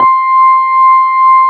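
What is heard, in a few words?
Wurlitzer C5 - L; USB Soundscan vol.09; AKAI; Keyboards Old School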